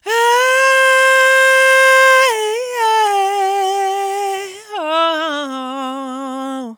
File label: "Heeey" - Dmaj, Vocals, Chants